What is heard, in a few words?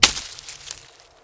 bush; Mafie 1